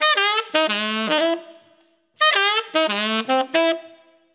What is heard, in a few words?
8beat Dmaj 110